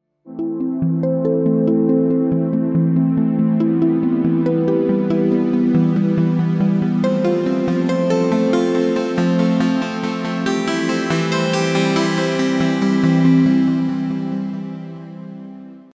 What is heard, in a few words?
Montage